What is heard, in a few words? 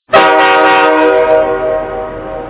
train horn; ze train; cstrike